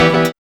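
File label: piano chord; X-Static Goldmine 1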